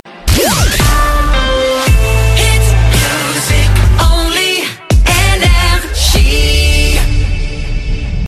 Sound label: Jingles; NRJ Shotgun ID (2025)